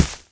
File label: jump